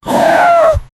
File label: a bear dying from attacki